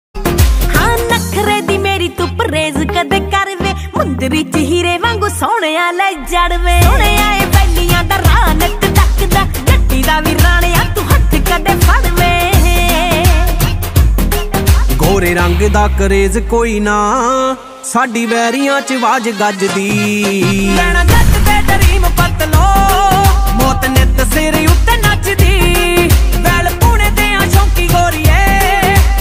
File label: Punjabi Beats